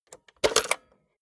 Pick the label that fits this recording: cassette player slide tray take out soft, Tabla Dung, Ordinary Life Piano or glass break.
cassette player slide tray take out soft